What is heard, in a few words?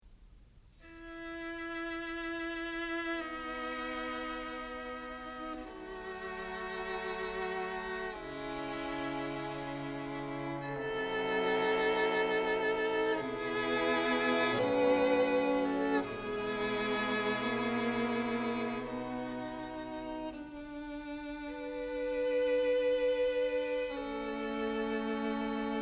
Beethoven String Quartet 8 In E Minor Op. 59 2 Molto Adagio; rasumovsky